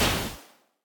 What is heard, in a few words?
magic Hit; b23 zuma